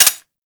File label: Uzi Mech; Warframe; Tenno